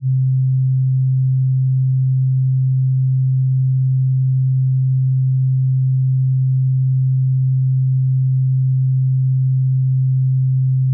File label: 131Hz; 130.813; C₄; c′ One-lined